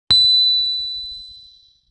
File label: LoFi Metal Chime Hit; Vintage Cartoon